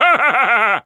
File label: Wizard Laugh